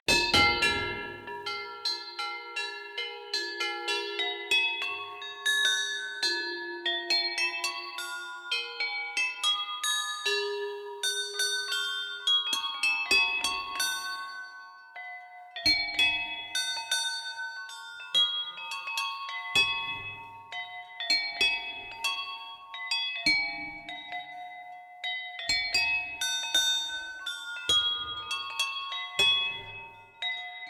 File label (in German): glockerl; fiesta antifa